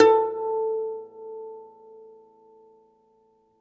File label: DOMRA, Plucked Strings